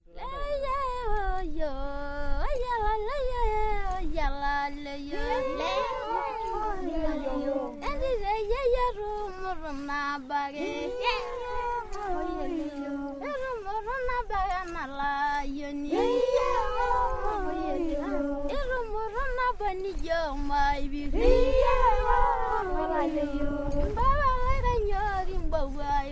masai song for female circumcision celebrations

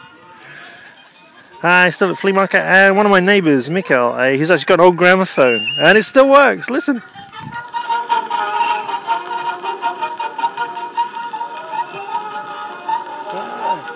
gramophone

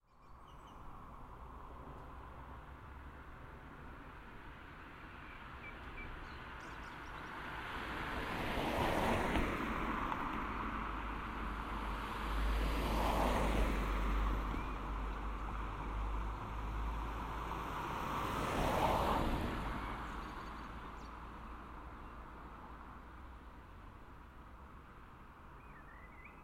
binaural recording of ambient sound

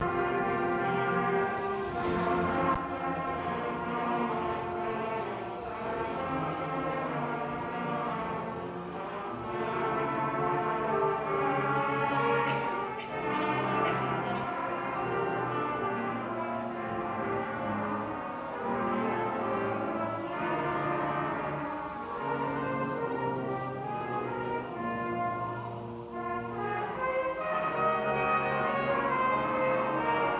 brass band time at the public again

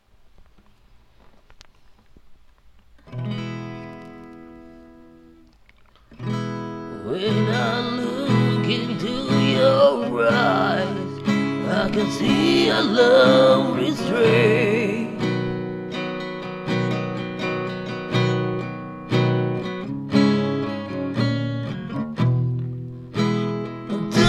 acoustic cover

